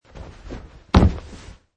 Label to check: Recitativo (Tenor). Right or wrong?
Wrong